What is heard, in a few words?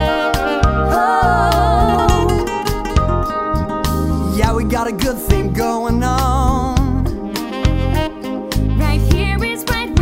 Vocal